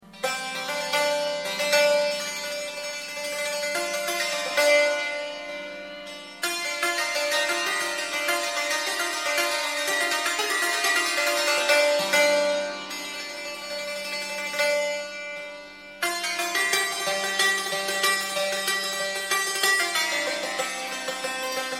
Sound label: bayatkord; kiani